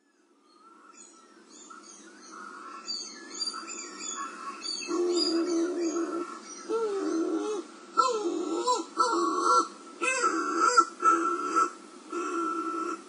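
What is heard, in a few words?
Streaked Shearwater; fukuoka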